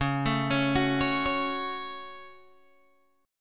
C sharp Suspended 4th Sharp 5th C sharp down Guitar Open G